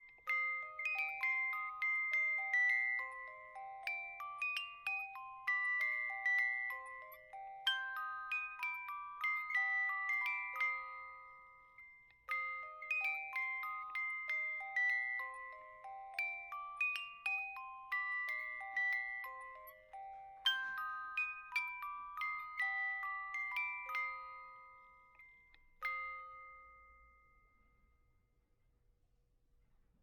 Boite A Musique

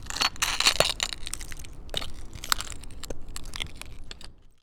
Verre Seul

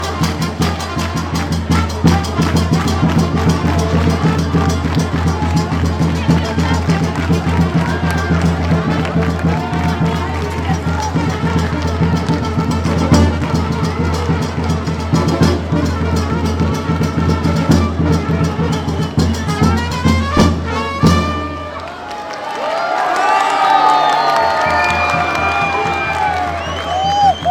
Sur Le Port De Marseille

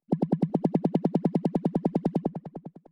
Helicoptere

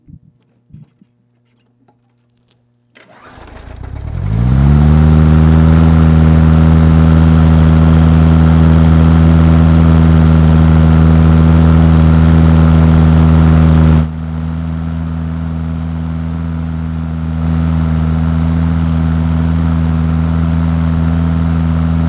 Tondeuse Mono

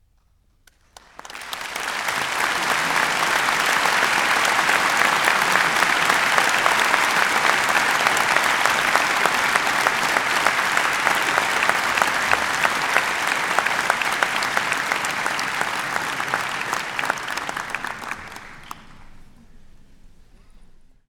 Applo Moyen Large

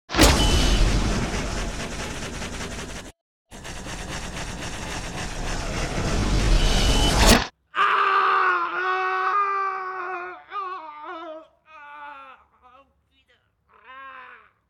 Arbalette Cri

